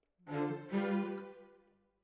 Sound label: Cellos